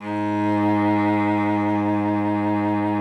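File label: G#2, cello vibrato; Xsample VOL-7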